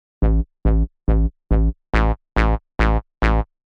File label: Offbeat Acid; alonso 19 year anniversary pack